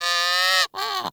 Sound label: Sea Bird Vocal Captured Idle; Characters; Warframe; Ostron; Hunting Critters